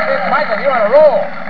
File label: roll